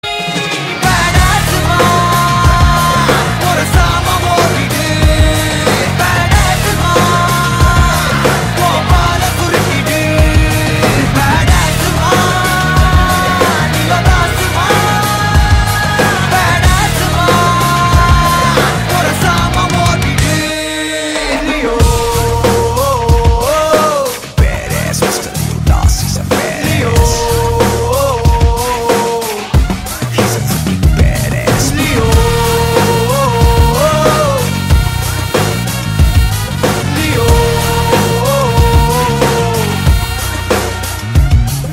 Badass Hindi Ringtone

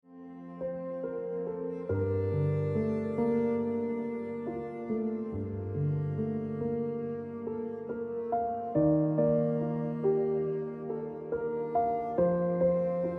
Emotional Life Ringtone Piano Music

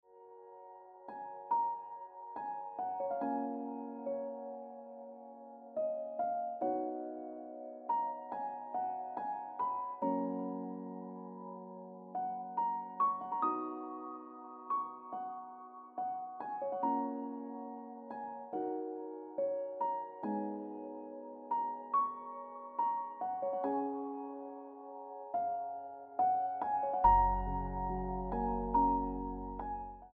Ringtone

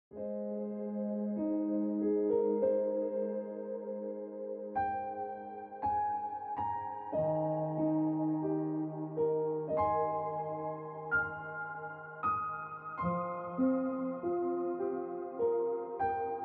Ringtone Piano Music

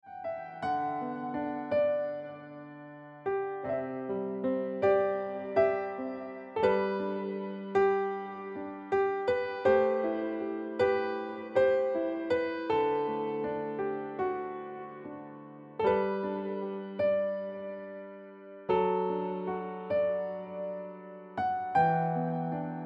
Ringtone

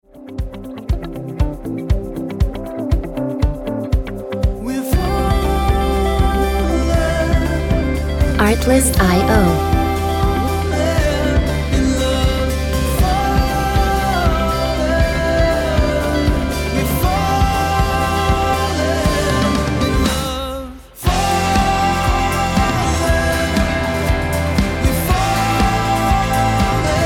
Falling Ringtone

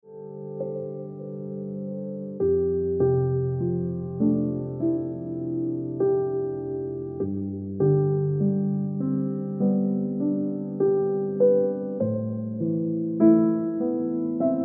Instant Ringtone Piano Music

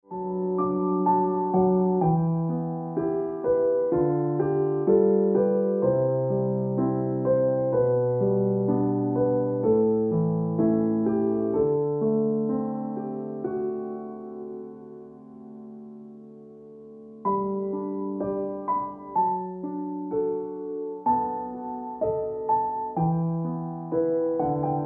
Piano Music

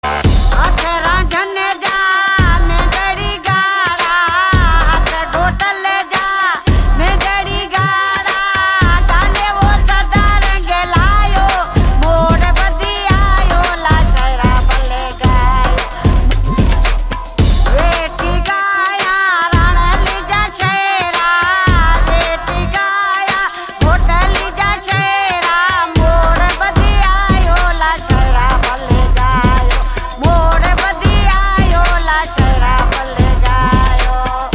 Rap Ringtone

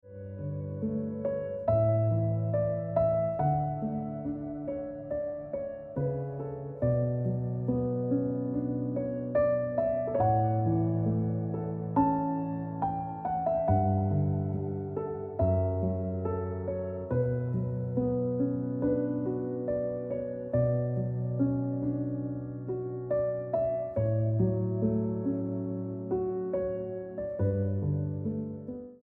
Piano Music

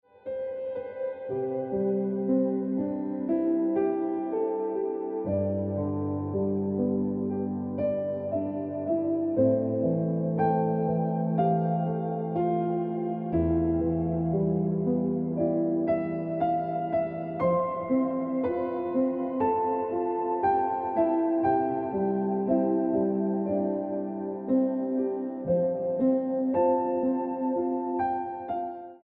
Ringtone Piano Music

